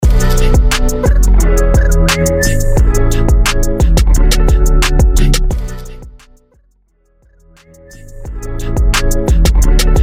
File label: Hip Hop Rap; Mainstream VIP; Mixshow; Vegas Edit